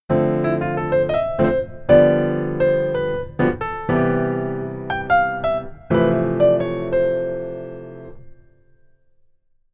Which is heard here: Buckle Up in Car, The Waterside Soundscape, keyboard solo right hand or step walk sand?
keyboard solo right hand